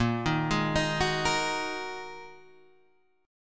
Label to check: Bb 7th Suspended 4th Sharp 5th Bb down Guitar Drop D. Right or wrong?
Right